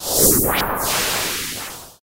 Missile

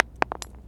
Railroad Rock

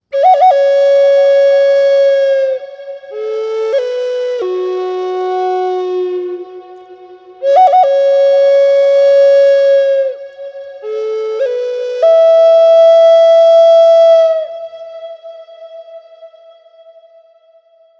Native American Flute Western

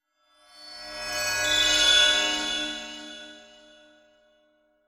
Bel Tone Magic Wand; Freed

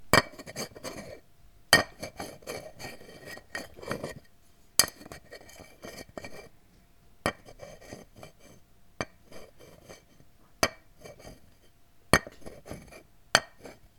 Clay Hits

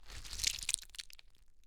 Slime

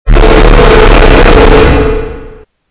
Jump scare

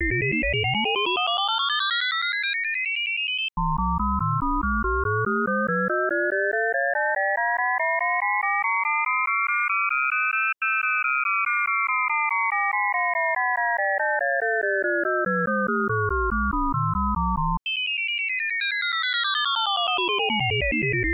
Bleepy Tone Sweep

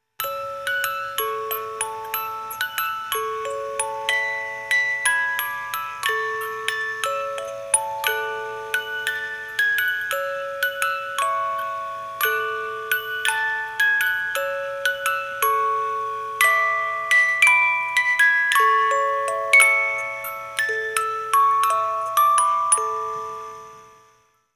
Music Box Silent Night

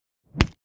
Punch

